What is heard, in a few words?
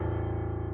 key; keySounds